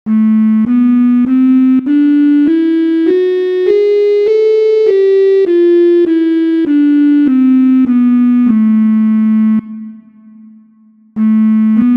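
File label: Melodic Minor Example; melodic form on the fourth line only (the natural minor scale is used on the first three lines)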